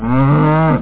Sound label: bear; software vault